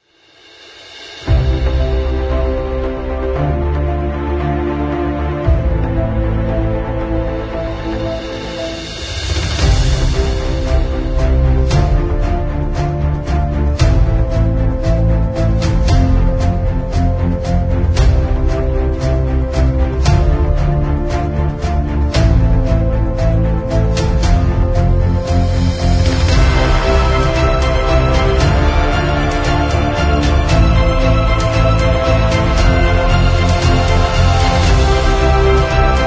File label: Epic Inspired Corporate